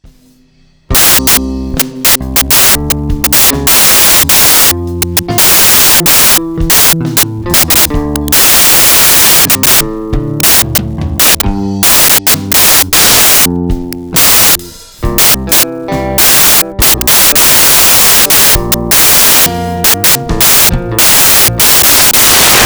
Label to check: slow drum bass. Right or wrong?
Right